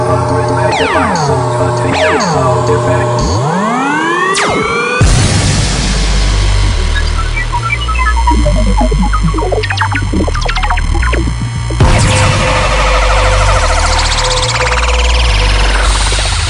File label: Hip Hop Electronic